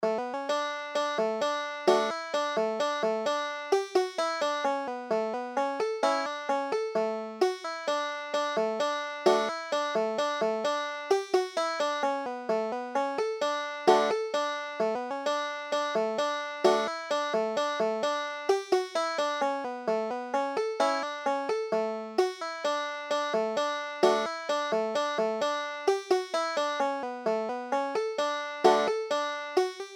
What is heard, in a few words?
green willis, banjo